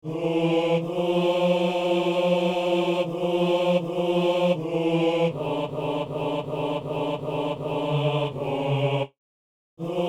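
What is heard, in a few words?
tenor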